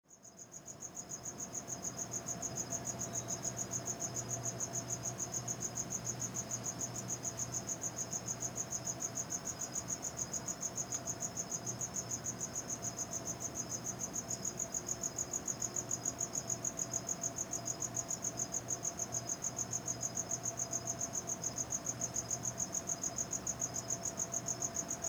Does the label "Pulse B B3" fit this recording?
No